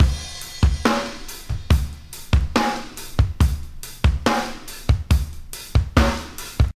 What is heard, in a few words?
70.7 bpm reggae drum loop c